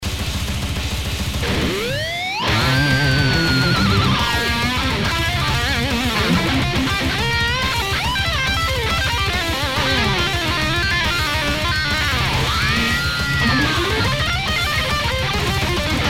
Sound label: solo